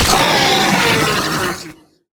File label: draugr death; npc